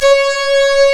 C#, strings; Big Fish Audio

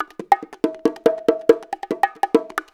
BONGO 140

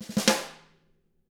ruff, snare; ILIO; Double Platinum Drums 1; SNARE ROLLS

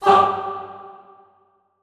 FAH G2 L, Extended Classical Choir; Best Service

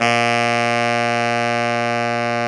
SAX TENOR, Super Sax Tenor mf

